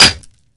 concrete, bullet collide

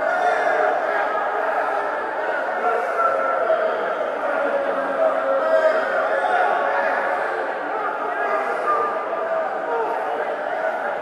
crowd, arena; ambient

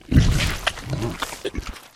flesh eat, monsters

